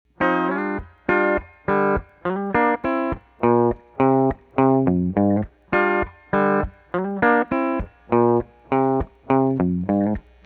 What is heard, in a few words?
57 Clean Guit